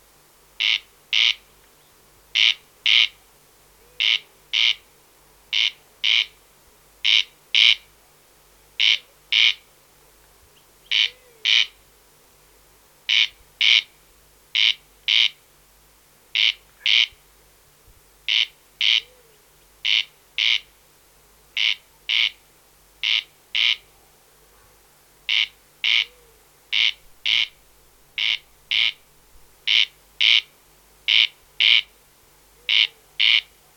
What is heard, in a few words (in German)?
Wachtelkönig Crex crex Gesang; Bergmann